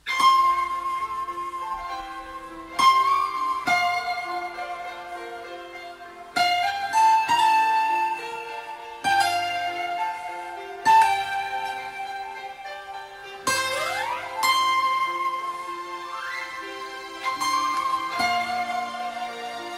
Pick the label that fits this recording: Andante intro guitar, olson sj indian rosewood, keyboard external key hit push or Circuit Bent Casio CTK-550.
Andante intro guitar